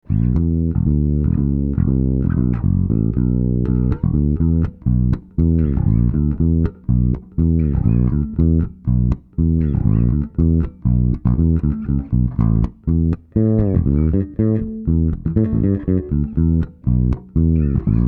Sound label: BASS ONLY